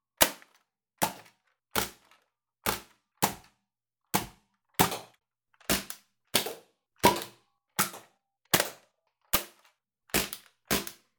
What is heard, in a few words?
Nerf Shots